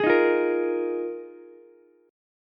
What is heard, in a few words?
D sharp Suspended 2nd Suspended 4th, Ukulele; strummed; chord; 13,15,13,13; JGuitar